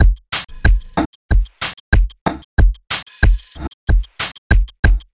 Drum Loop

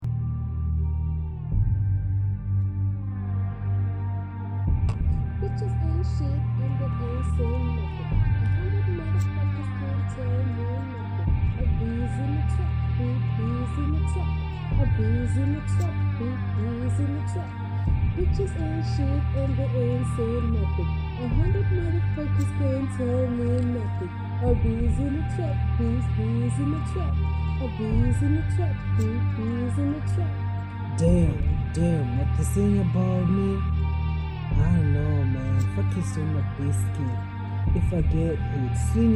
trap remix

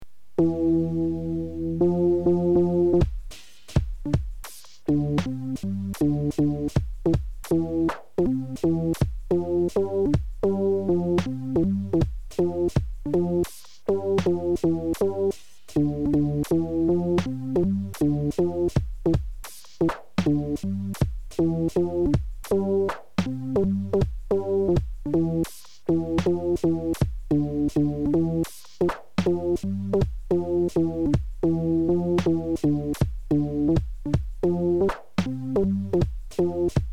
beat

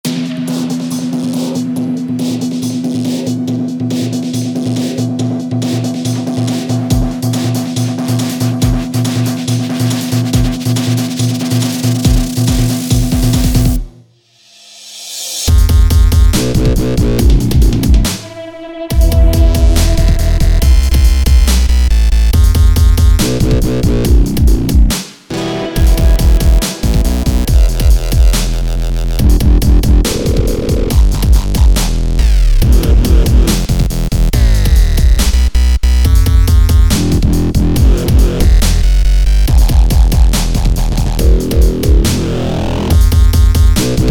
World drums

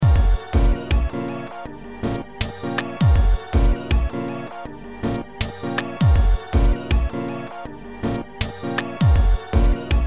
Beat

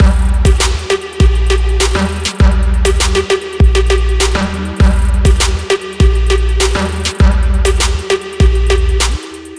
trap

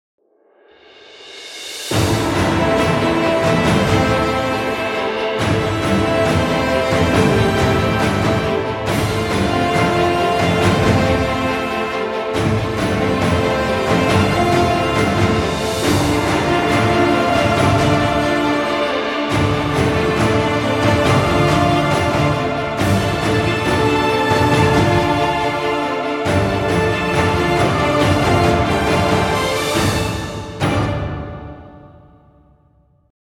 Epic Journey, Epic Music

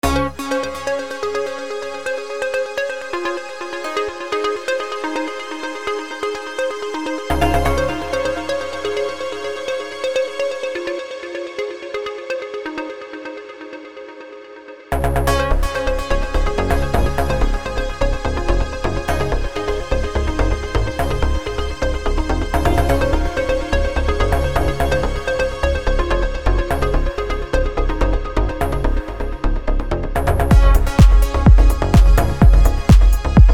trance

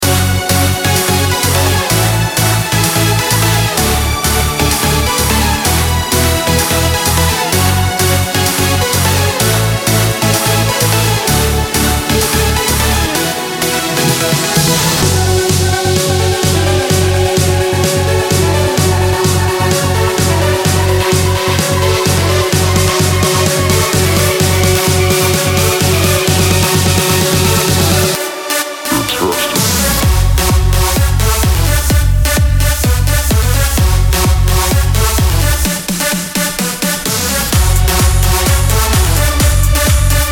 Electro Dance Movement

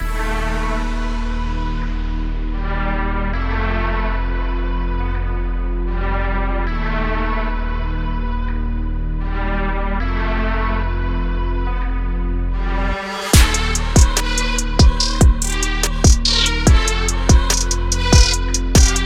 beat

